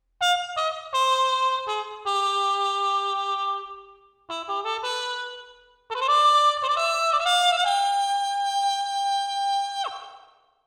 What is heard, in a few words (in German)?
Trompete EMT250